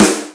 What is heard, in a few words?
SNR GATE